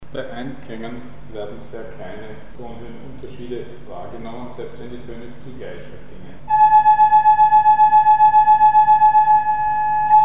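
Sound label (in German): Hören sehr kleiner Frequenzunterschiede im sozusagen verstimmten Einklang; Mikrobuch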